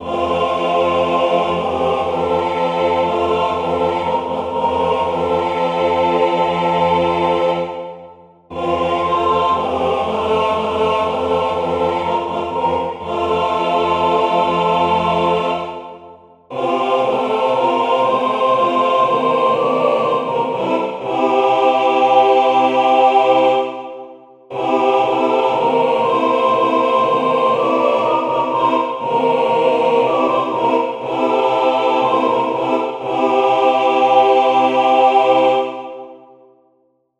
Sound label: drummer whole